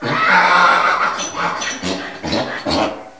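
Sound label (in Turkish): katır, hayvanlar; efekt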